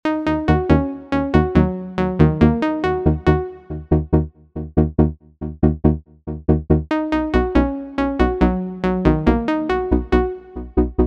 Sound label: Chords